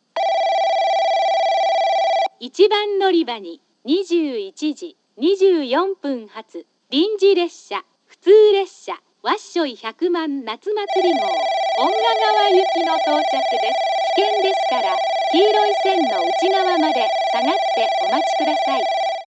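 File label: summer festival; 戸畑駅 接近放送（遠賀川行き）; natumaturi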